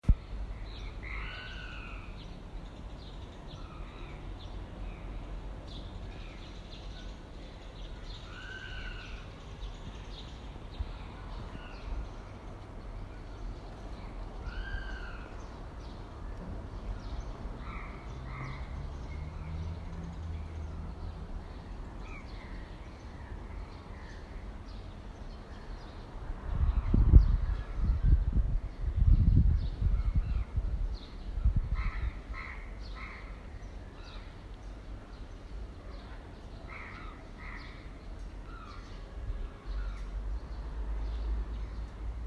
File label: 42nd Street birds and quiet ambient sounds